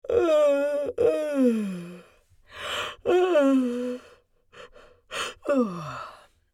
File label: Old lady tired yawns